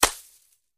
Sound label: Arrow Hits Arrow Hit